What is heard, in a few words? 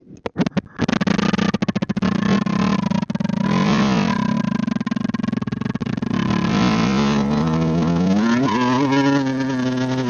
some car sounds